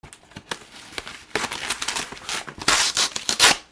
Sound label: RIPPING